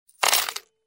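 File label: coins thrown into a piggy bank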